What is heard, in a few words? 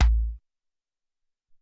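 Hop Kick 4, Alesis SR-18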